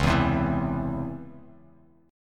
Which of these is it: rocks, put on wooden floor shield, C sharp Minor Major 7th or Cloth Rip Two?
C sharp Minor Major 7th